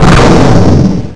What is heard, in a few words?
blast; quake2; weapons